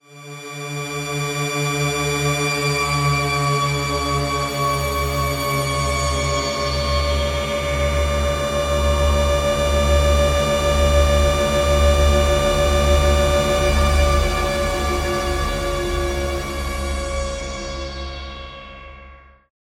atmo chaotic environment

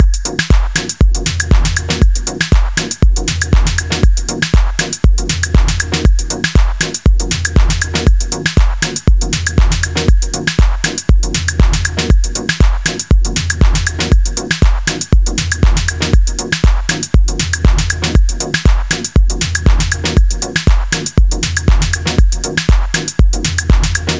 arp

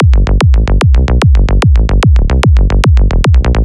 sequence kick melo 148 bpm

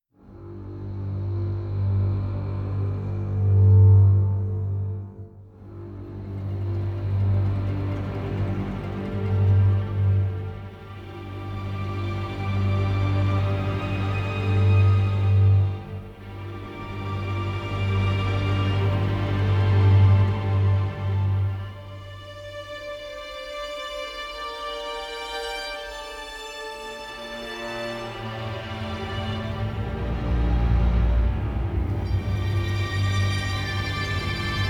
strings only; styx

